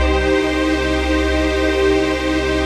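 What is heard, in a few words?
chord pad, Pads; Best Service; XX Large - Pads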